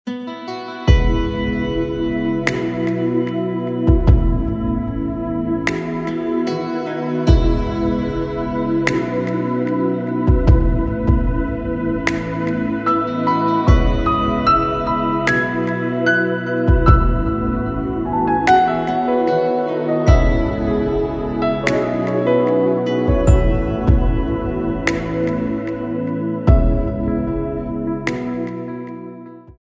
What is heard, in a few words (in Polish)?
wersja instrumentalna / podkład; Krakowskie Centrum Artystyczne